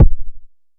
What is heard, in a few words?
analog quick, Kickbank